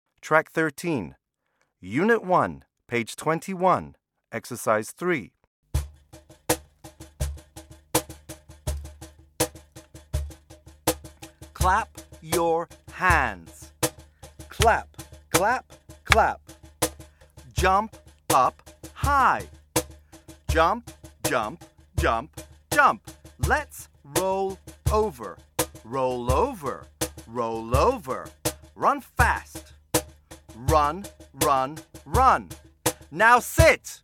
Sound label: Clap your hands